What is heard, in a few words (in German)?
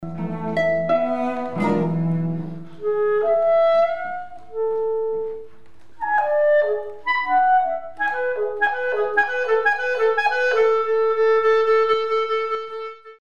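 Ähnliche Melodik Nachtigall Mikrotöne mit Klarinette; Mikrobuch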